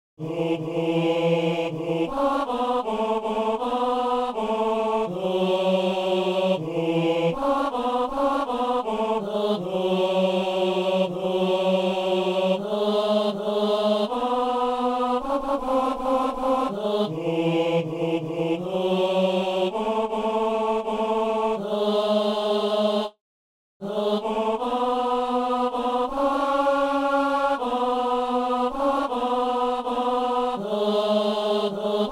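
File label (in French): ténor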